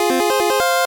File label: 8bit se